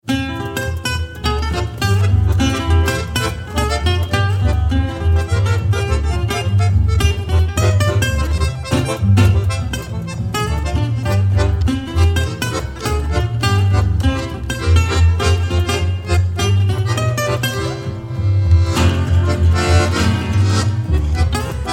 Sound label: Swing Guitar